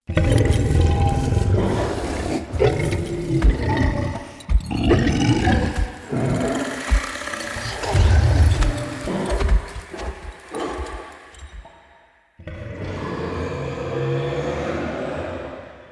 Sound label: Corrupted Walk Backwards; Bromius; Foley